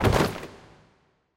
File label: furniture bookstack; main hall